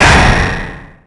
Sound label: pound